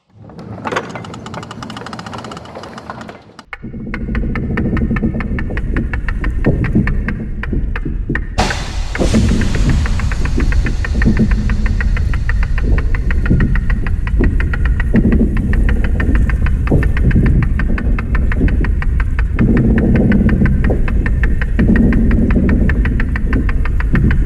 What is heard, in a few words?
big door open